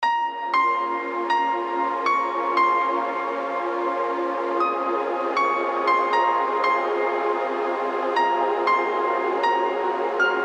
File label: instrumental mix